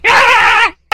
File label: kamikadze walk; guns n zombies 2014 pc